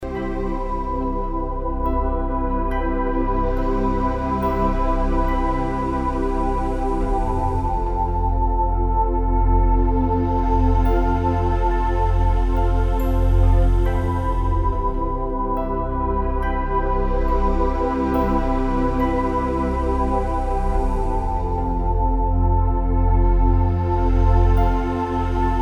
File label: Looping